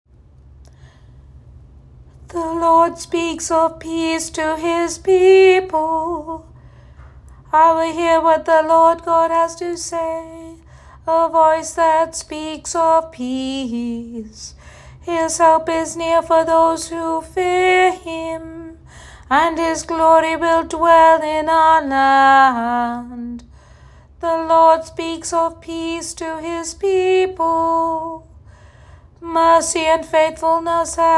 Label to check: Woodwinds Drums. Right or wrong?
Wrong